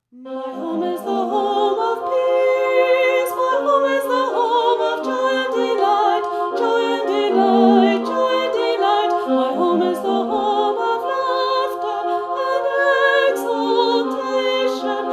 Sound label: soprano; home of peace